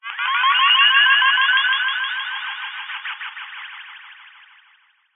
science fiction radio receiver transmission interference burst eerie message; zapsplat